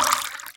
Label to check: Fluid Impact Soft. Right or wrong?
Right